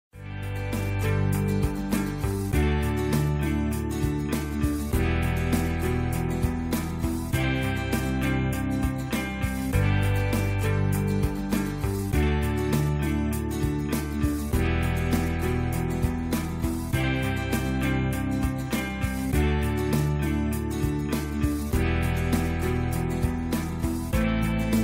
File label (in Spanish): Otro fondo musical para spot publicitario